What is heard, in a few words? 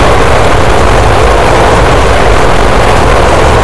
skid gravel, Vehicle; NASCAR Thunder 2004